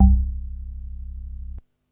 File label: C1, two tone house organ